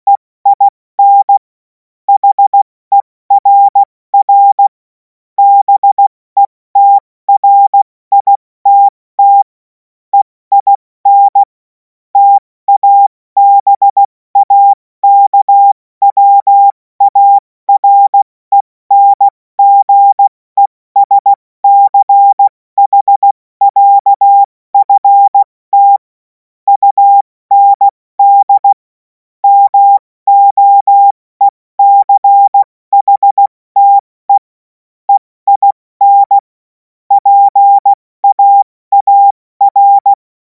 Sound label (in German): Witz, cw morsen; Morsekurs